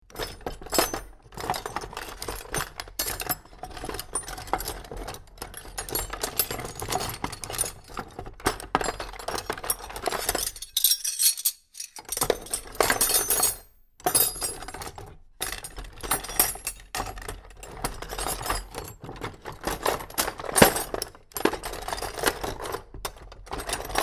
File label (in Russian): yashik s instrumentami